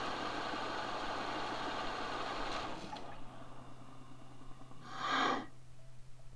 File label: whoosh